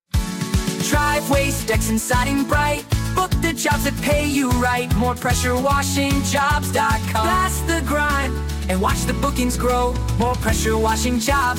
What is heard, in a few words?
jingle